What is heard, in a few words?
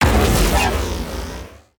kids game lose destruct explosion radio synthetic negative